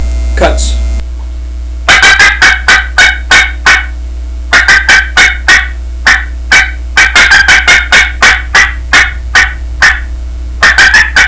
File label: cuttaway cutts, turkey; Southland Sounds